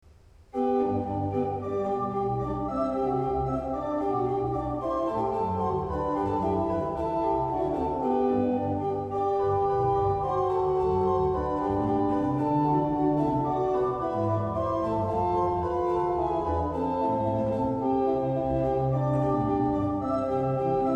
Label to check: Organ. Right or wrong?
Right